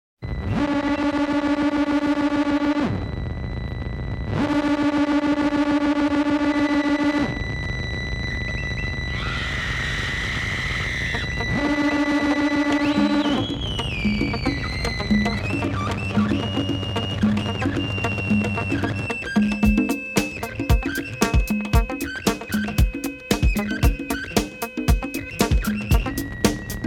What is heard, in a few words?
Black Box Disco